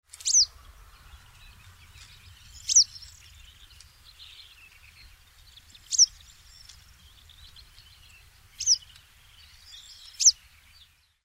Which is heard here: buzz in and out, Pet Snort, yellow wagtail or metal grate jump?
yellow wagtail